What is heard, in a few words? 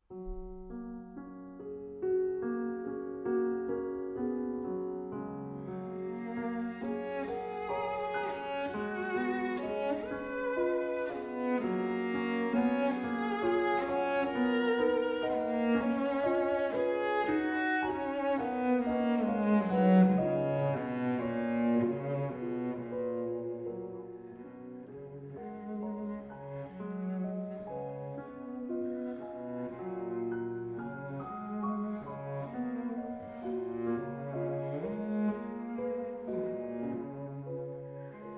Berceuse cello piano